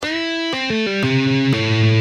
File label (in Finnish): 4 likki